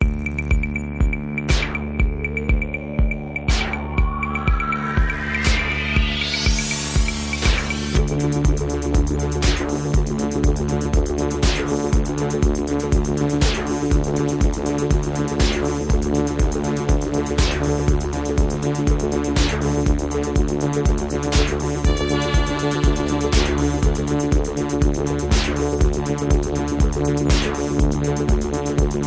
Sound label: Instrumental Dub Version